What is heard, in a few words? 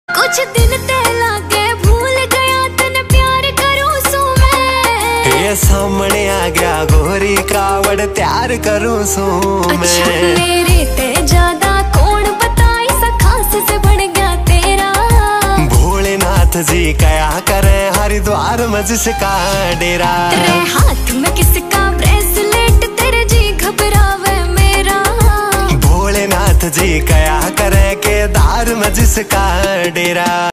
haryanvi ringtone